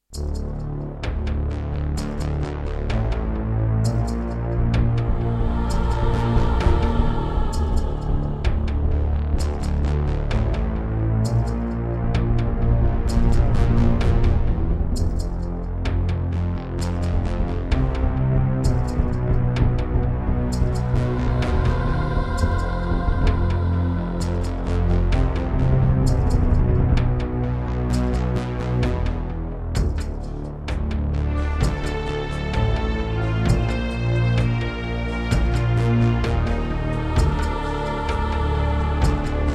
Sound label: Korg Audio Gallery AG-10; Descent II - Briefing